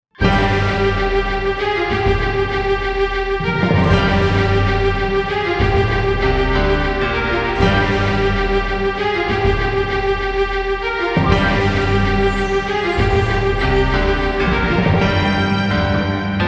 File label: Drama Groove